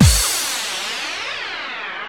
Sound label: BD-FX, Dance Mega Drums; Best Service